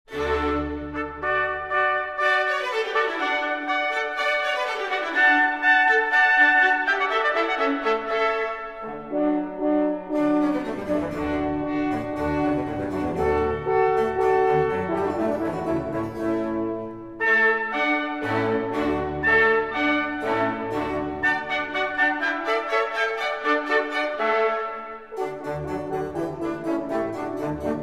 water music suite no 2 in d major hwv 349 i andante allegro